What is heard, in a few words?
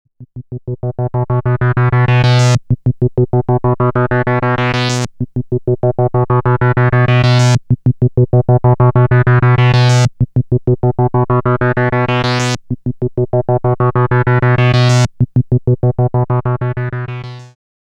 Seq C